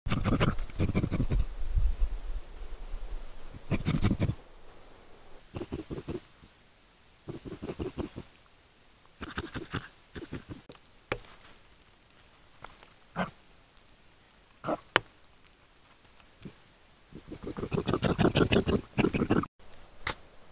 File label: wolverine; ولورین